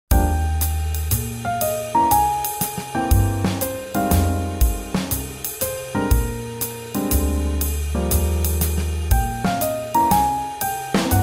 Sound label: Swing Jazz